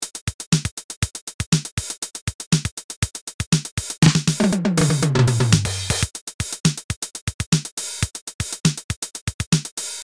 TR 707; Roland Cloud

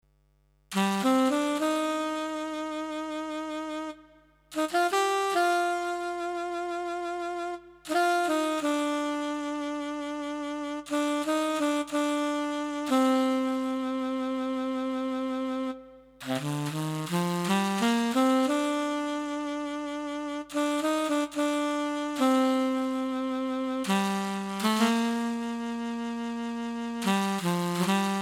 S650 Sax Brass

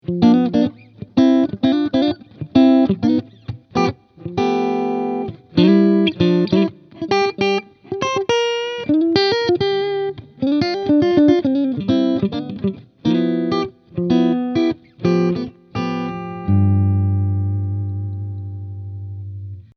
Clean

